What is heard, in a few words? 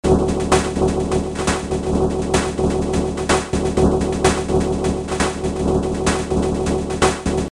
drum